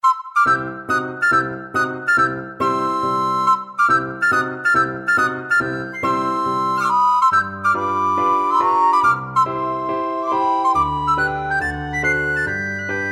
Rondo militaire mit Klavier in C

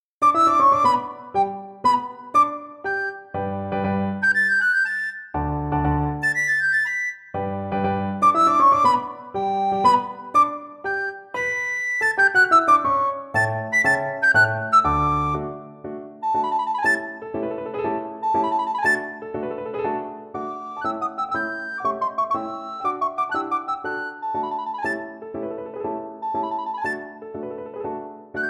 Klangbeispiel Fuenf Maersche Sopranblockfloete & Pianoforte; Ernest Kraemer

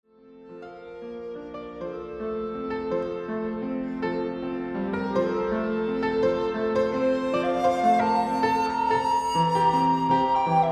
Sonate C-Dur Andante; KV 296 Andante Soste

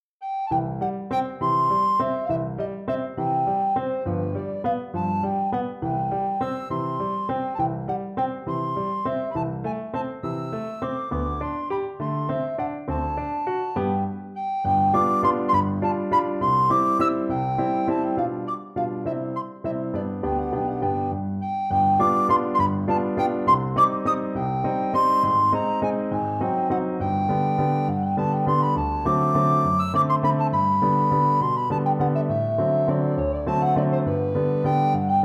Altblockfloete; aus Kraehmer Op 33 Band 1

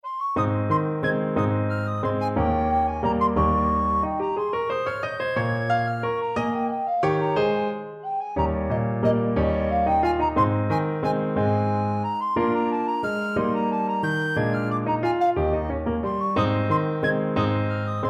Klangbeispiel Valses fuer Csakan mit Pianoforte oder Gitarre; 2 Ernest Kraehmer Valses Op 21

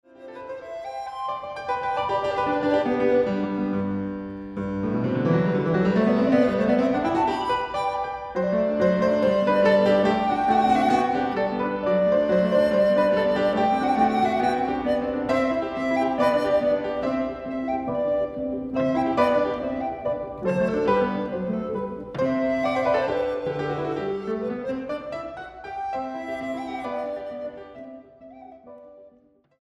Sonate C-Dur

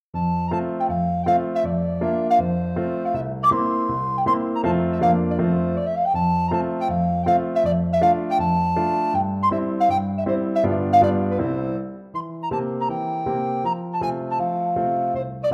Klanbeispiel Altblockfloete; aus Kraehmer Op 33 Band 1

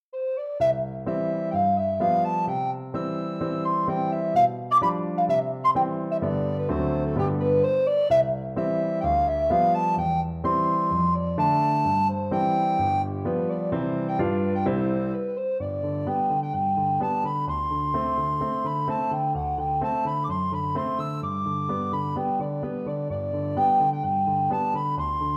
Altblockfloete; Nr. 4 Kraehmer Op 33 Band 2

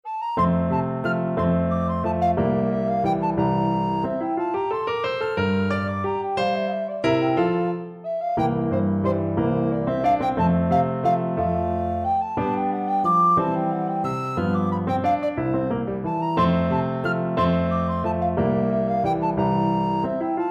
Blockfloete & Klavier; 2 Ernest Kraehmer Valses Op 21

